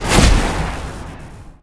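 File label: skill big arrow impact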